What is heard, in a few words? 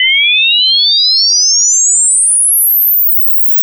IMD sweep